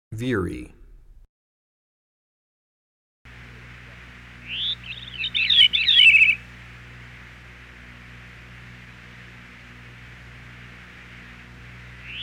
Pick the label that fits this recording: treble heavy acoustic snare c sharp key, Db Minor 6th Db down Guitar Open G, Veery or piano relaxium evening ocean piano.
Veery